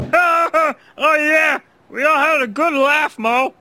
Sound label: Laugh; Barney Gumble; The Simpsons